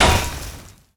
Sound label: Snow Mine Attack; MUDevs S20.1-3